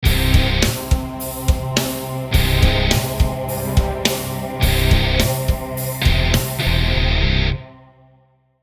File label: Battle Win; Lost Sector